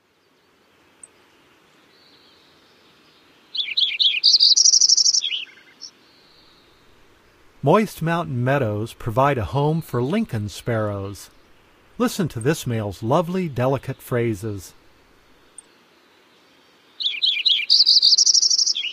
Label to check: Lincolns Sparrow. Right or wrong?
Right